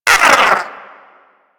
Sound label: Wyrm Idle, Vocals; Warframe; Pets